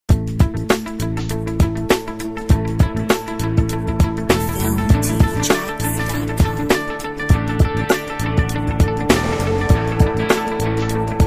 Ocean Highway

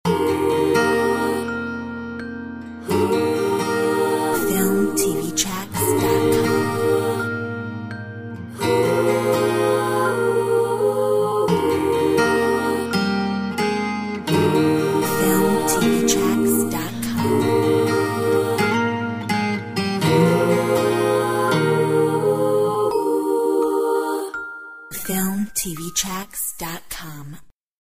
Relaxing day out

